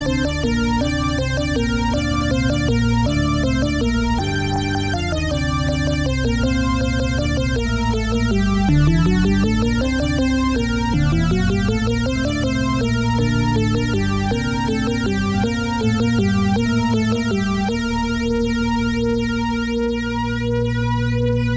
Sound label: Electronica Bells